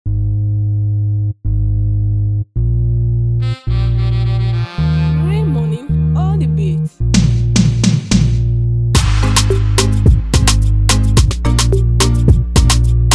Type Beat

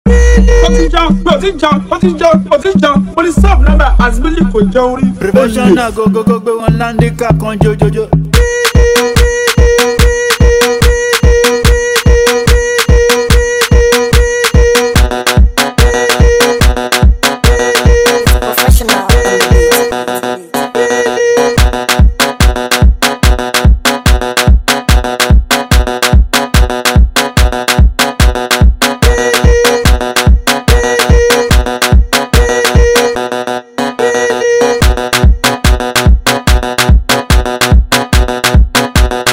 Professional Beat

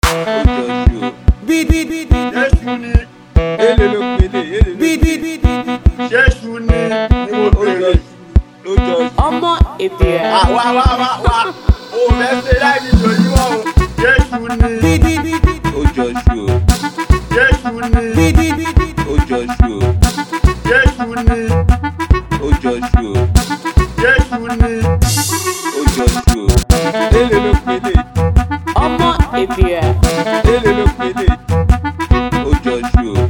Cruise Beat

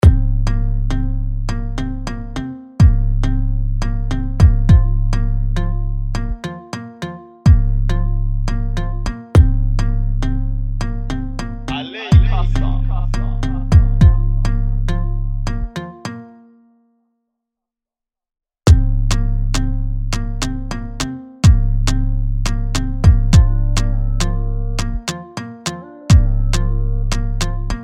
Ocean Instrumental

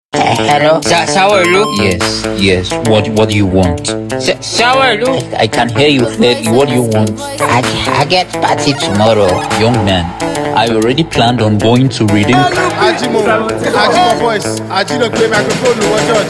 Dance Beat

